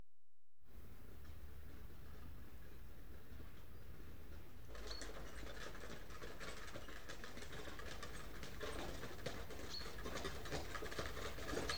One Horse And Cart Pass At Walk; Horses & Dogs; BBC Sound Effects Library

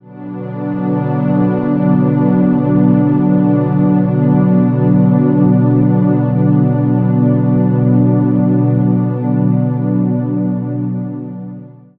eternity synth chord, ethereal ambient; BluezoneCorp

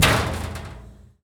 metal impact; Sound Design Tools; Raw Foley

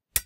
sword; Foley; Kenney's Sound Pack

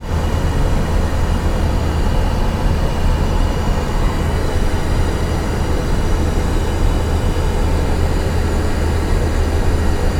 aircraft airplane commercial bathroom room noise; Airplanes & Airports; Matt Script; Walla & Ambience

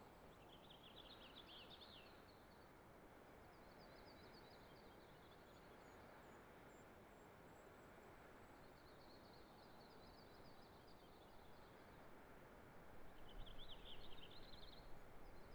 Spring Lookout Snow Birds, Forest Meadow Birds; Hood Spring 2016

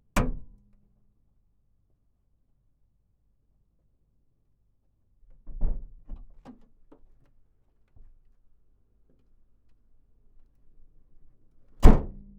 Toyota Land Cruiser Hood Various Engine Center; LX Turbo 1985; MKH8020

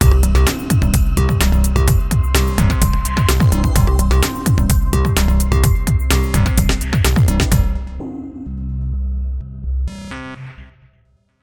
Signature Sound; FXHome; Signature Tracks

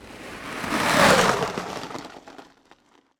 Friction Organic, Whoosh Sweeteners; SoundBits; Just Whoosh 4

